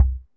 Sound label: Stop; Lock A Luck